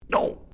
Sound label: Created Creature Small Ouch, Alien Sound Effects; Created creatures, vocalisations and pretend speech